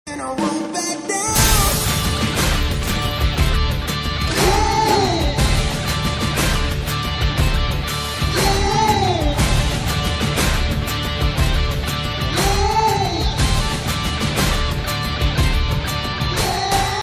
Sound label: Action BGM Ringtone; Vishal; Telugu